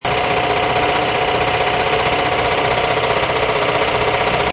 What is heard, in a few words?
Air Compressor Loop, Medium size air compressor, close, very noisy, low rumble; seamless loop. Can also be a power generator or general motorized util; Air Pump; Lynne Music